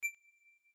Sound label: bip